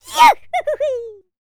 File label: shouts; World Voices; YODEL YELL; ILIO; Vocal Planet